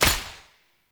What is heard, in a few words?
Hunter Bow Shot, bows; Weapons; Enemy